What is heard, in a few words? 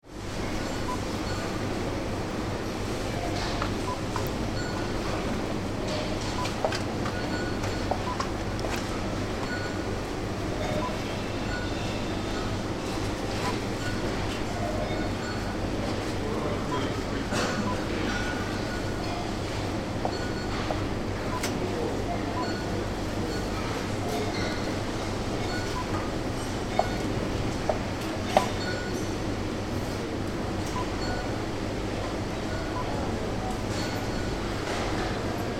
Super Market Ambience; Tesco